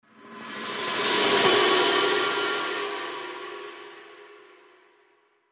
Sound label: Cymbal Roll